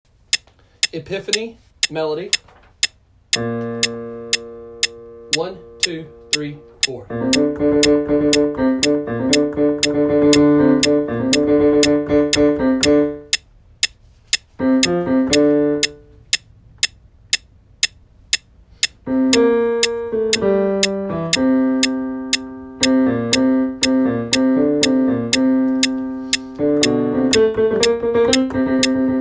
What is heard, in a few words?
melody